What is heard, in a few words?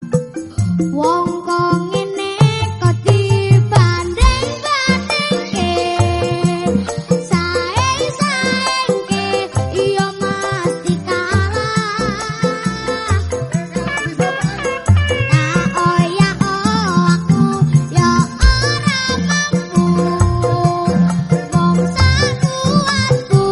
Bgm theme remix